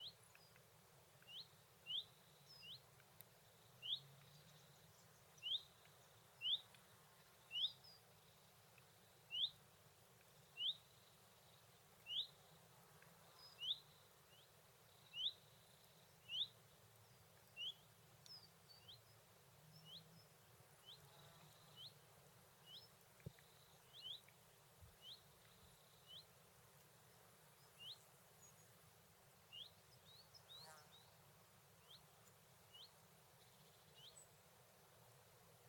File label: Ambient Summer Field French Mountain Birds Stereo